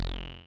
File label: squeltch; al-jazari